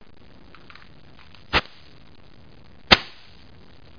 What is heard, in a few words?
match; Broderbund Print Shop Multimedia Organizer Deluxe Edition